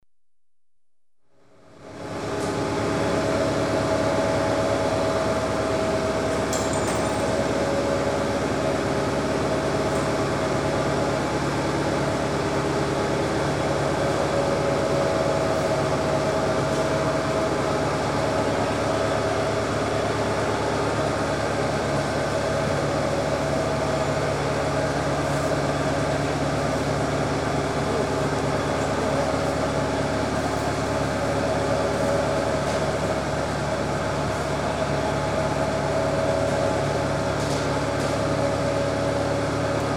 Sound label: Lifeboat, Winching boat up launching ramp; The BBC Sound Effects Library